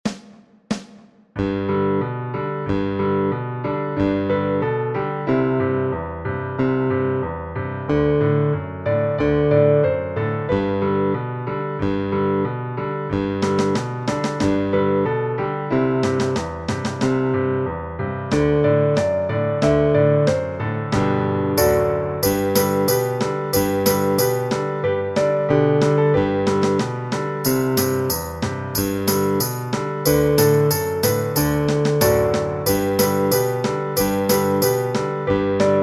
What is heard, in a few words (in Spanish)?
KARAOKE Jingle Bells Sol Mayor; 50 Vilancicos